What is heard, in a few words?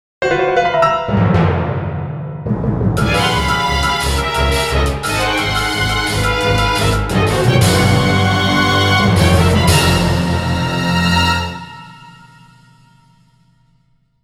BGM Thrilling